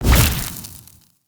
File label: fire damage